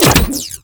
Briefcase Launcher Mech, Weapons; Warframe; Corpus

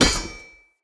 Shield Impact Default; Weapon Impacts; Enemy; Shields; Sinecure Shield

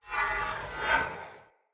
Great Sword Whoosh Accent Spin; Weapons; Player; Mendicant Great Sword

